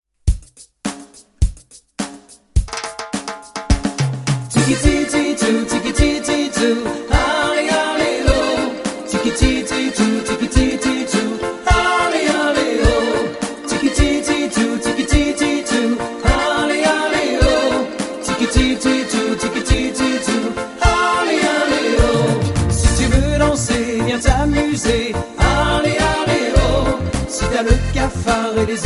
Le Reggae

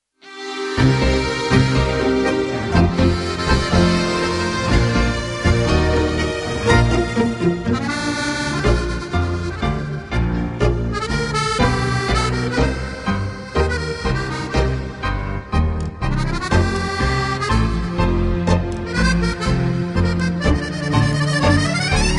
Joli tango d'amour

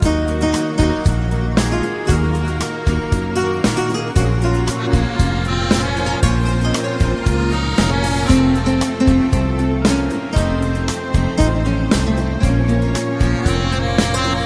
Une guitare dans la nuit

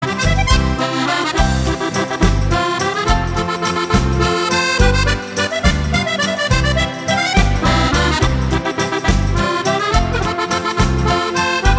La valse colombienne

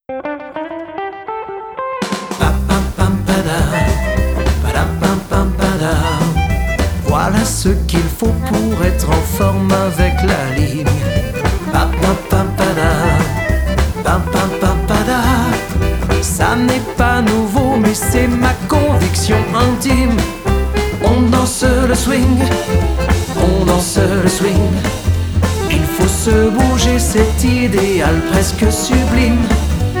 swing

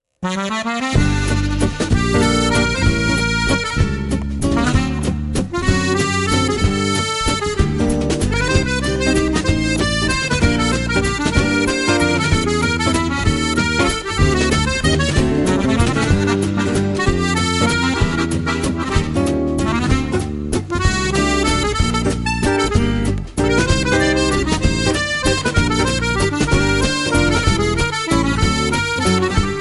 Romance gitane

